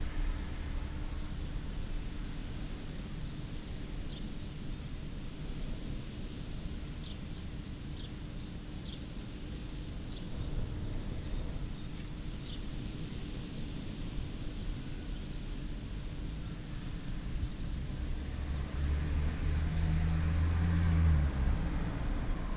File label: ribeira hum wind